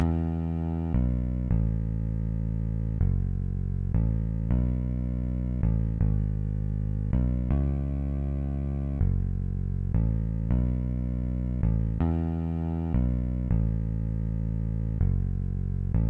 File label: Town Bass; backpack hero gamerip 2022